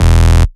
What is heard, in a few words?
Bass Shot E